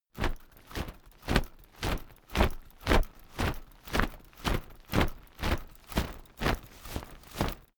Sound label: umbrella telescopic shake slow hard